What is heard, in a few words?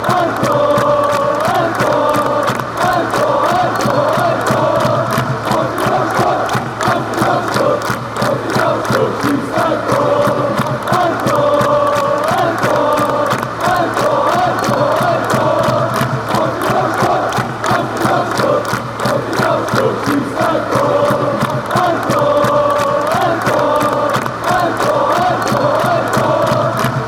a goal, fan chants